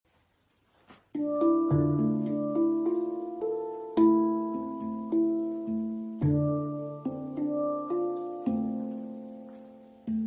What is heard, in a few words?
12 notes, handpan